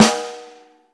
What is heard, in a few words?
huge snare drum sample a key, snare drum sample tuned to the A note